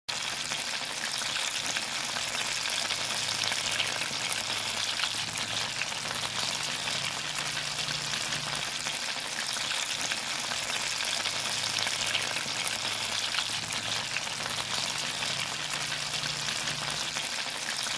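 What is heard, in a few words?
deep fryer; fritiurnicy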